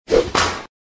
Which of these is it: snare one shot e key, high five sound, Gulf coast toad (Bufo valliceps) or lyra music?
high five sound